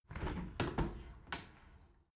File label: window open tilt soft sequence